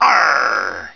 ARGHH; Pirates! Gold

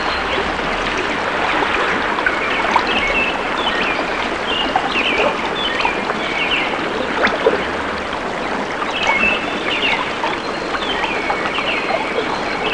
birds by the water; nature; Sound Effects for Multimedia - Volume 1

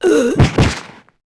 f DIE; urbanchaos

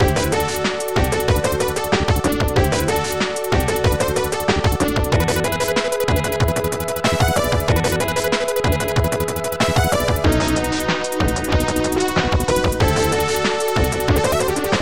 tune; Trolls; Protracker Module

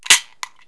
dart shot; KABOOM! - Wild and Wacky Sound Software; house; fun

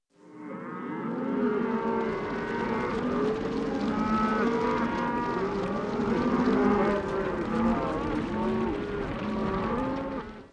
stampede; Dinosaur Dig Cyber Adventure (2000)

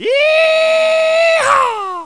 YEEHAW; mtm2demo; PC Gamer 4.2

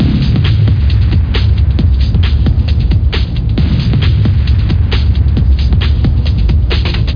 Robot Loop; blender volume1.5 1995

